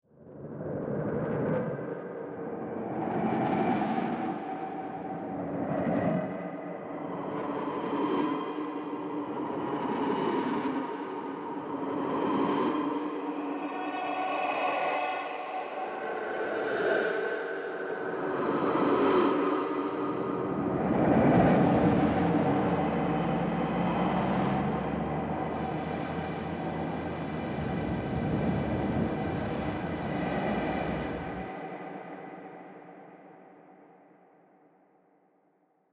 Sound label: Sci-fi soundscape loopable alien atmosphere